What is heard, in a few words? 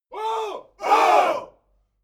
military shout "oh"